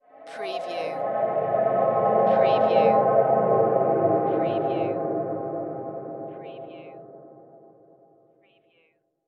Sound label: Swell Reverb